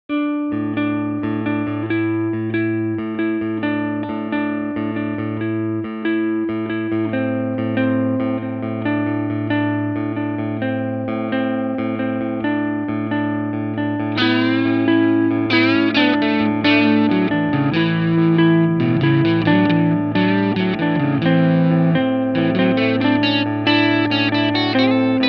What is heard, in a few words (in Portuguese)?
solo